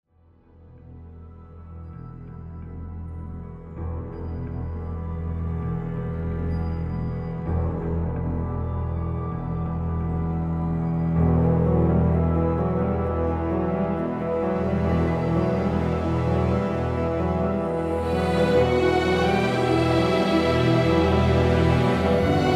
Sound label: Western Drama